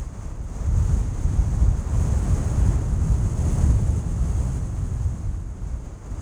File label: wind; Soldier Of Fortune II Double Helix; XBOX; kamchatka